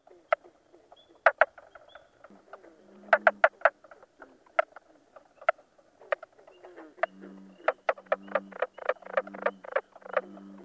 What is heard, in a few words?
Mink Frog; spring